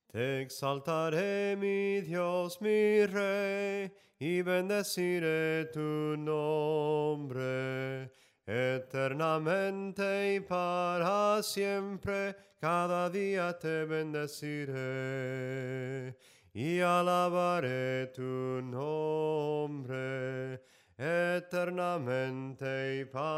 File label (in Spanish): SOPRANO CANTADO, himnos en melodia soprano